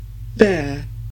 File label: bear; animal